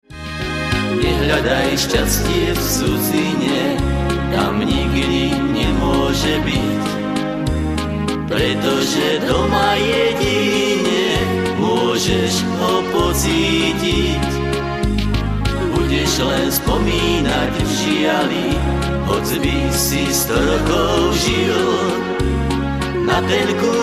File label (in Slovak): akord